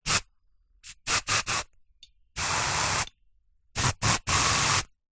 household deodorant aerosol spray various

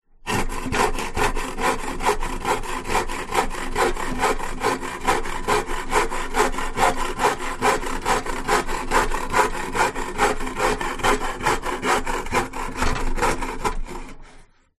Handsaw

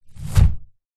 rope hangman drop thud tighten on beam; foley; zapsplat

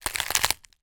food potato chip crisp crush; zapsplat

